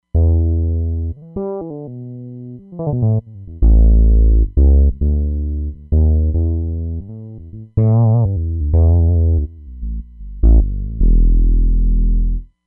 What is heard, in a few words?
FS Fretnot, Roland Fantom XR